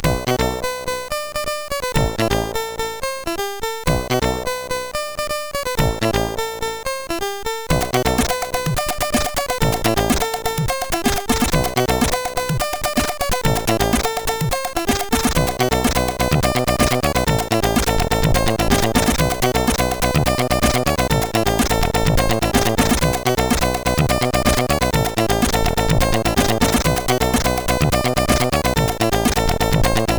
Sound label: Latin, CSG8580R5